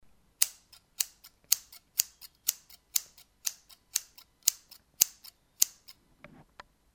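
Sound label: cutting scissors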